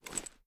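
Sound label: drop, pistol; gmod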